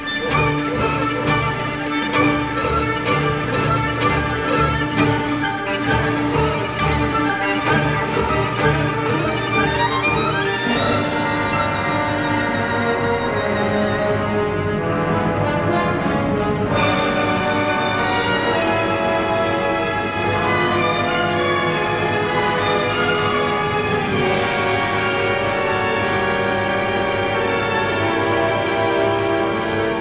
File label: classical